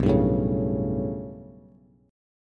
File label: B 7th Suspended 2nd Sharp 5th, down, Bass, Bass Standard; B7sus2#5 strummed; chord shape; JGuitar